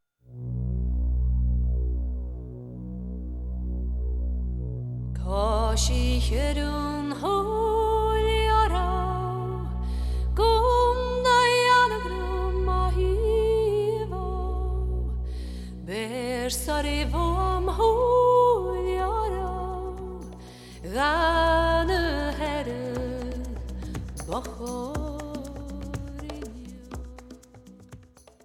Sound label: modal